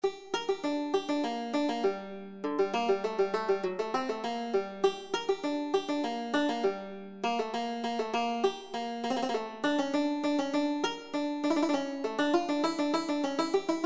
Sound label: bach badinerie, banjo